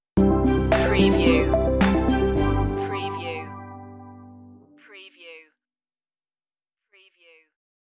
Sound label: corporate dreams stinger